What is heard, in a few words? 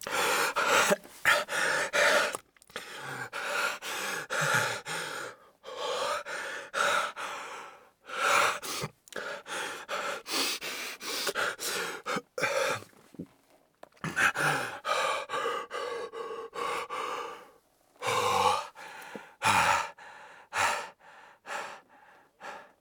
breath hurt; Escape from Tarkov; scav4